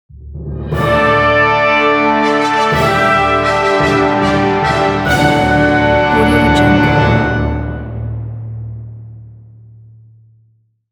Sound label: heroic trumpet fanfare